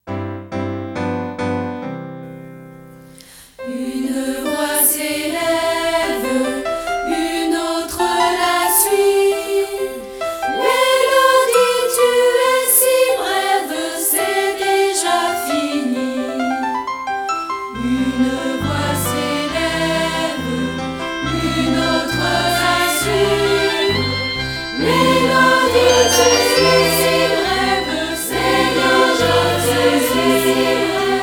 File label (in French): Mélodie